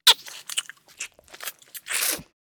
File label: Vampire sucking blood feeding